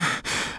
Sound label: land soft; shadman